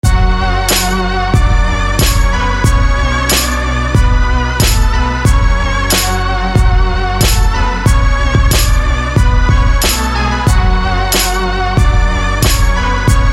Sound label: Synth; WestStyle; SFB FX